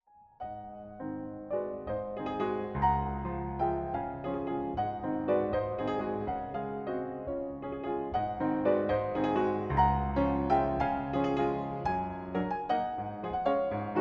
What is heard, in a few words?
valse